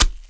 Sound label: adrenaline needle in